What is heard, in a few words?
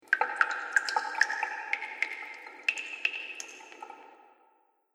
Water Fantasy Cave Drips